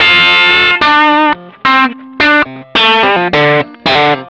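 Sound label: blue riff, rock, vintage blues guitar; USB Soundscan vol.22; AKAI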